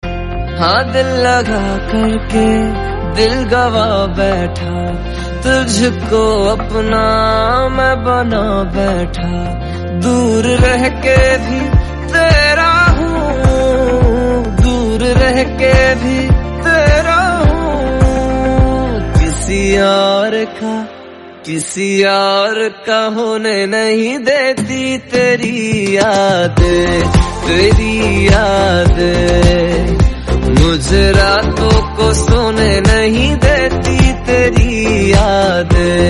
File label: Hindi